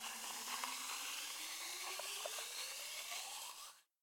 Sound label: Door Pneuma Up Long